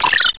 maggot angry

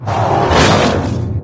claw; king

